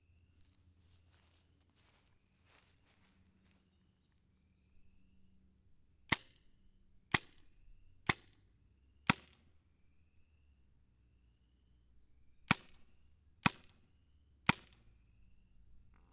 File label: vm-68 from back shortbarrel, guns